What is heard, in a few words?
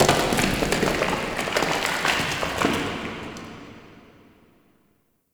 Rock Movement, Asteroid; Warframe; Grineer